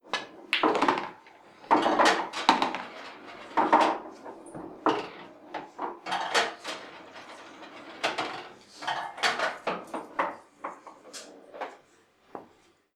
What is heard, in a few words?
Pool Ball Rolling Through The Mechanism